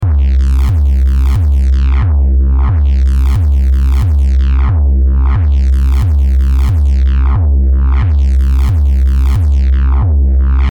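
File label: Bass C major 90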